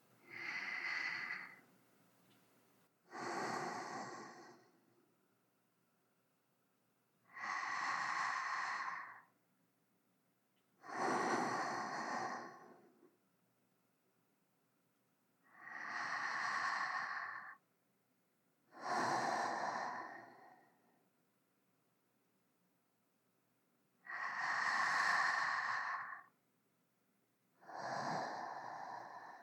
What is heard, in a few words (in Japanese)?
寝息